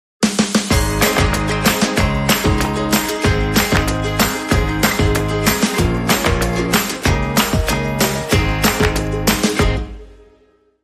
Acoustic Voyage, Sting

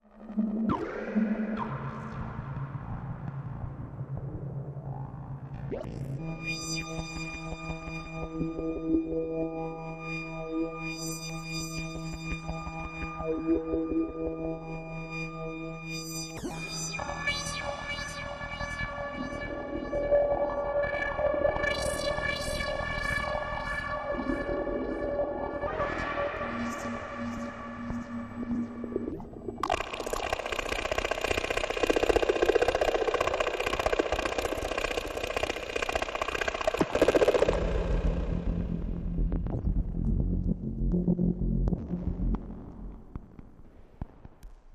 Insomnia Musical Pulsers

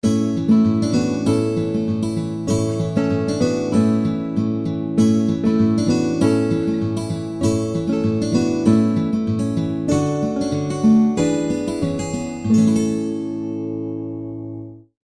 Simple Folk

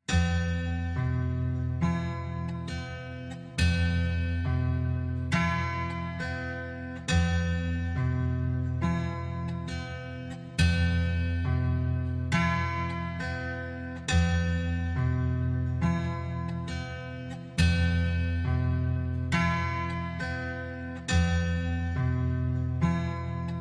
Dulcimer

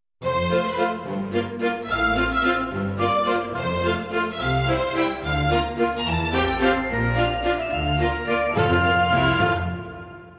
Grand Waltz

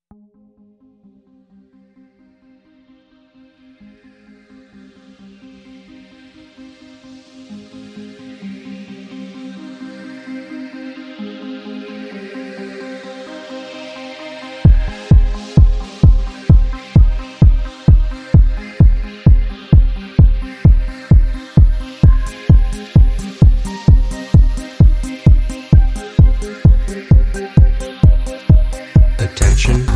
Tentation

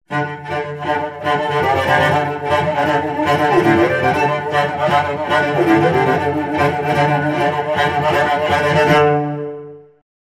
Cello Danger Excitement

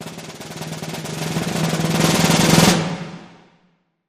Toy Drum Cres

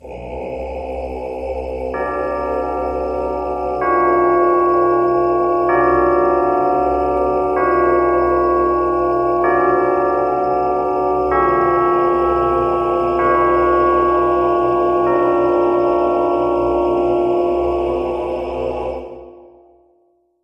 Choir and Tub Bells

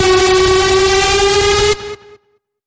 Feel The Bend, F sharp

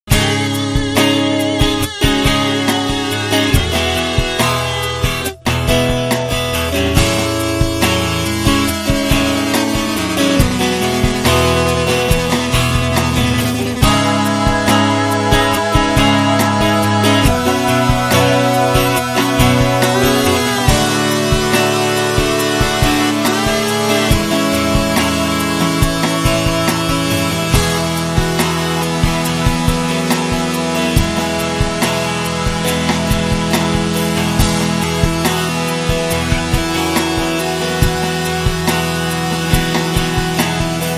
Crazy Synth Outro

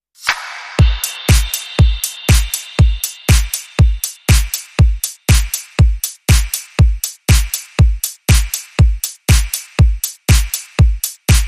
Short Chill Beat

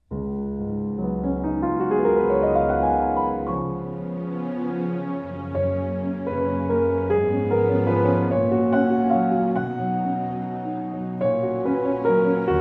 With Strings

